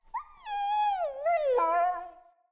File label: Wolf Whine Concerned; Animals; Perception